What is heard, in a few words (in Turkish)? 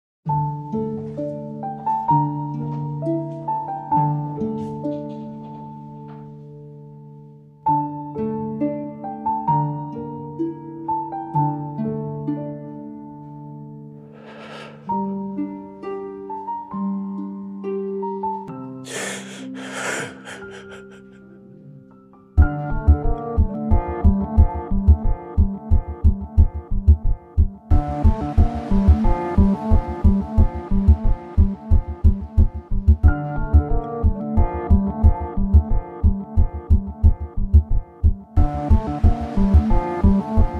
Enstrümantal fon müzik